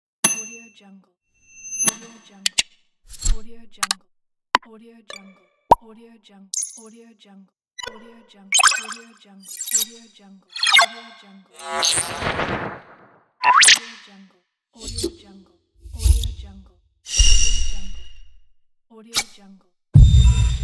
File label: technology sound effects pack; alexandersizonenko